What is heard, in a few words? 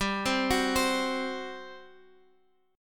G Major 7th Suspended 4th G, Guitar Open G; down